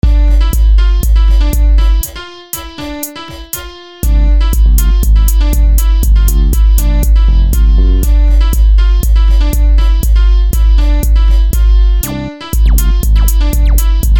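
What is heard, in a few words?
melody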